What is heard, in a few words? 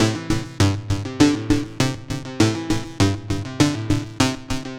synth 100 bouncy C Maj; Transmission-X